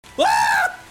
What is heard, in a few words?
wahhh